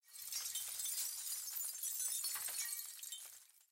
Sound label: explosive glass layer